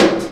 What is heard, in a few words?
Snare, Percussion Hits, Snares